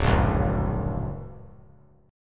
D Augmented Major 7th down; D+M7 strummed; JGuitar

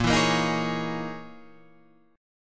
Db Minor 6th Add 9th Bb

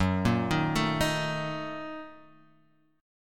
A sharp Major 7th Suspended 4th Sharp 5th F sharp

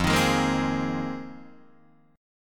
F Minor 11th, Guitar DADGAD

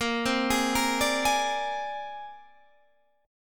C 7th Suspended 2nd Sharp 5th, Bb

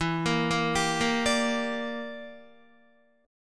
E Minor 7th Flat 5th E, Guitar; Em7b5 arpeggiated; 11,13,10,12,10,10

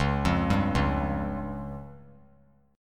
Db Major Flat 5th; arpeggiated; JGuitar

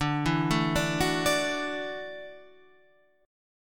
Gb Minor 7th Sharp 5th, Guitar

